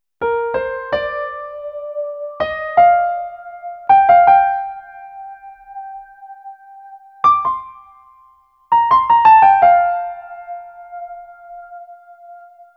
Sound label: Hi Piano Fills, Electro Lounge; Sonic Foundry (Sony Creative Software); 081 Laser Love